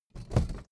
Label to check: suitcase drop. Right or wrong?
Right